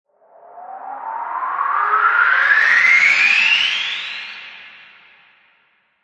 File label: Ascend Slow Whoosh; Scifi Production Elements; Scifi Production Elements, Set 1; LynneMusic